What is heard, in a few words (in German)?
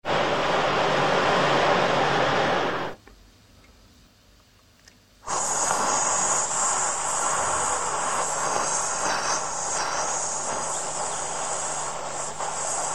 rauschen